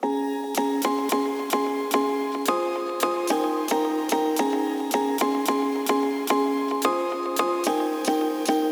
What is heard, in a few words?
Piano Modern Pop Chords G#m 110; Melody; Keyboards